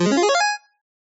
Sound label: Ball, Skee-Ball; TheStable; Pyralix